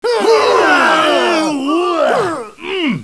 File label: GRUNTS